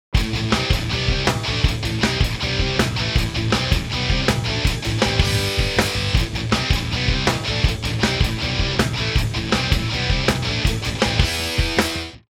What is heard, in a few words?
METAL